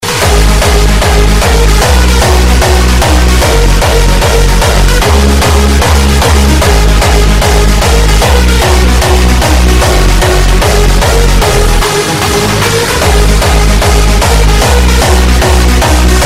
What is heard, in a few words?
Hardstyle